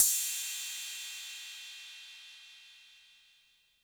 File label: Cymbal 808 Color